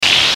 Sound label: static blip; Static Blip Fast Attack; Production Element Imaging Element Accent Transition; Blastwave